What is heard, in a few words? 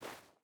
footstep barefoot snow walk; Rust